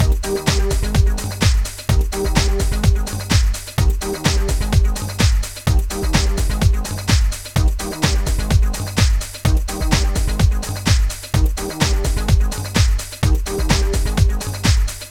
House 127bpm

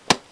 Block

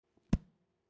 Shut Book

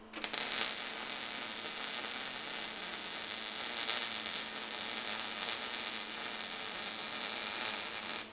Weld Metal Shock Electric

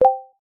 Notification Sound

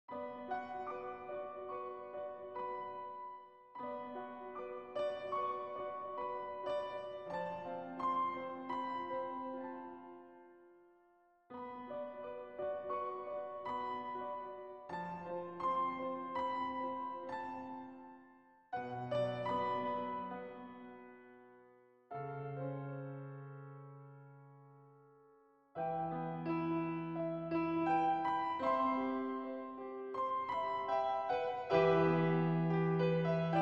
Anime Piano Film Score Soundscape Far Away

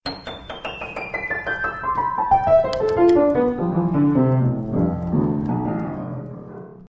Piano Notes Scale

